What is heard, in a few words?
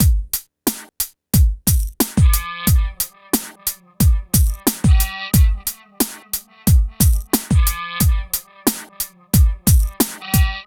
PHATT BEA; E-MU Formula 4000 Series Vol. 1; Hip Hop Nation; PhattBeats88-90